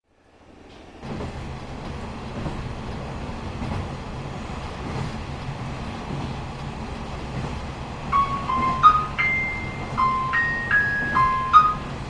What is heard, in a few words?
chime, rail